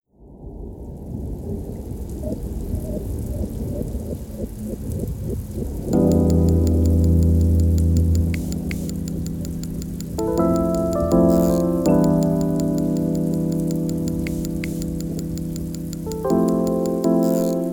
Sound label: DnB; Home Impacts; LA TOTALE Audio Demos